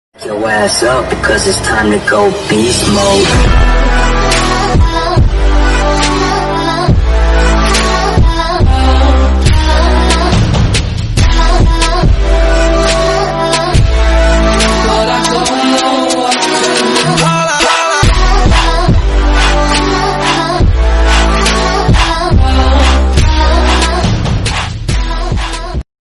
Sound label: remix bgm